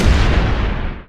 super jump; freak fortress 2; buff doge

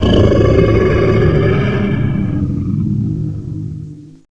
dog alert; lethalcompany; zerosiki; slender

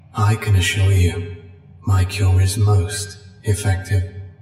jeers; 049; scp sf

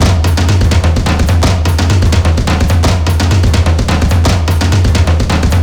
drums, zombat horde; szf

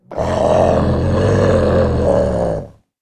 alert, male zombie; nmrih; zerosiki; slender

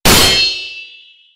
katana sound; tfdb

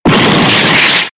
word fail; typing; tf2ware ultimate

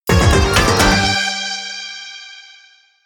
bad end; typing; tf2ware ultimate

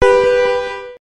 kart countdown; kart race

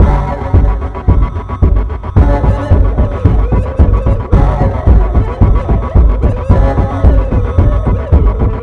chase music hard; itsteals; legs; slender

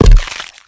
footsteps, run

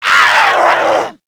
kid zombie attack; nmrih; childrenofthedead; zerosiki; slender

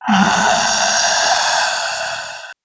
female zombie idle; nmrih; zerosiki; slender

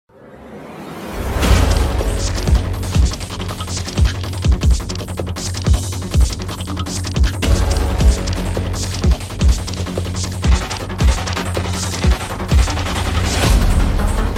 wave music; zombiesurvival; expidonsa waves

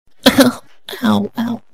stab; boykisser

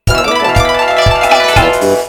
victory; tf2ware ultimate; music game; wii katandana

